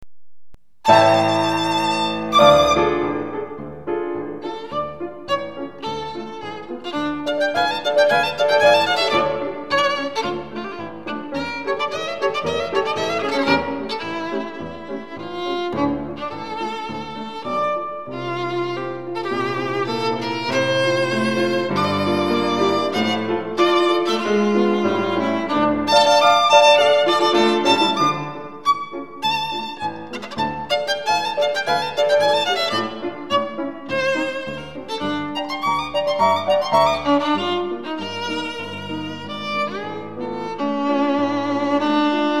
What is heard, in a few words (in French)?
Marche Miniature Viennoise; Kreisler